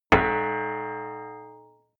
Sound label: plate hit ring stopped dissonant; hits impacts